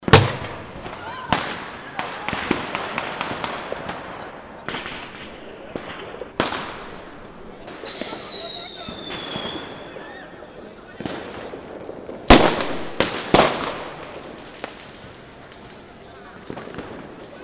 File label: fireworks atmo